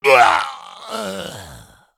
Male Ghoul Death

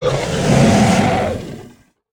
Hoarding Dragon Play

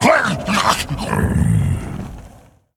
Male Elemental Death

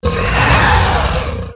Cave Hydra Play

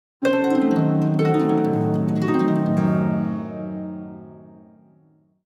Play Stinger

